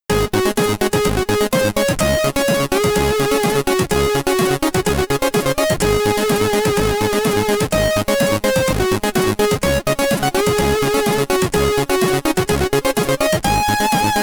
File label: Loading, SID Stereo; eon commodore 64